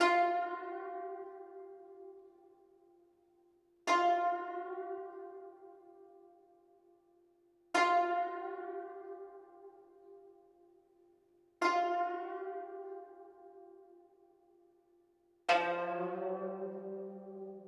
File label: 124 BPM C Min Guzheng; Cymatics; Destiny Gold Expansion; Mount Fuji